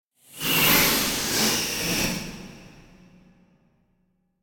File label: Whoosh Flying Ghost By; Hollywood Edge; sound designer's toolkit v2